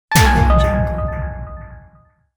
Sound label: scifi fail; fxprosound